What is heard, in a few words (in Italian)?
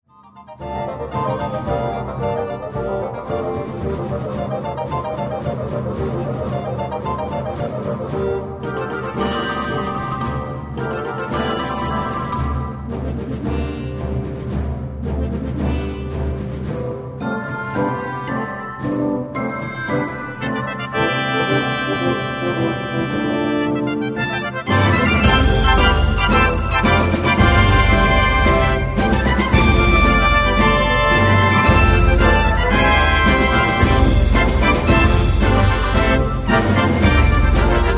Fanfaretta